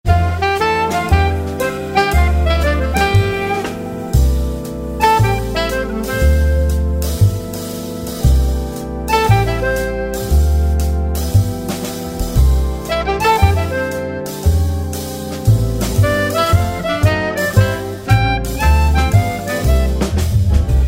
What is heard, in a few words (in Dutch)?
Improviseren refrein orkest